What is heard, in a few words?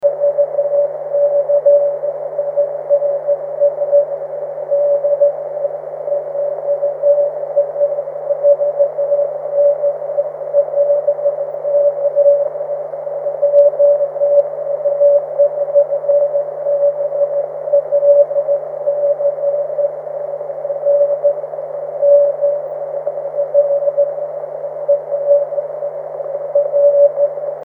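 13cm continuous wave; ja4blc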